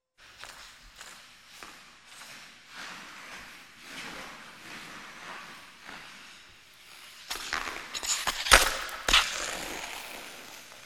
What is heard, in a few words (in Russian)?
variant pryzhka; Звуки катания на коньках